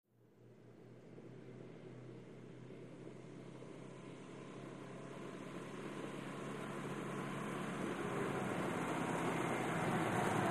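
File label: Buick, In Medium Speed On Gravel, Stop close up, Idle, Off, antiques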